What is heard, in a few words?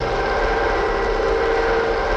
Torpedo Jet By, torpedos